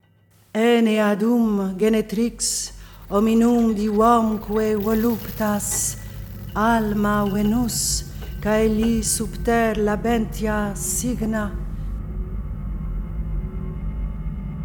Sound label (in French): Choeur Voix latine et sons